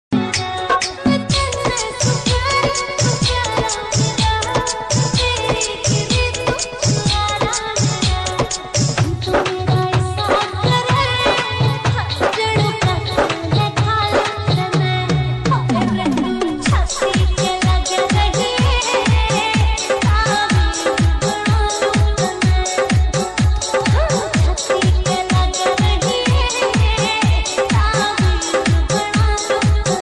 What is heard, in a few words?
Dj Remix